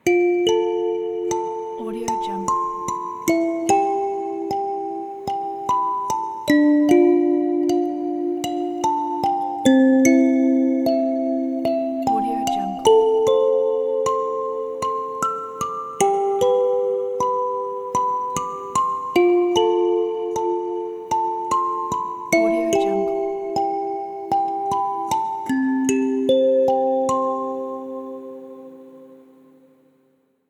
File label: cozy lullaby; relaxingambience